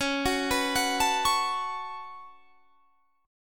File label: Db 7th Suspended 4th Sharp 5th Db down; Db7sus4#5 arpeggiated